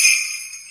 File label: single sleigh bell ring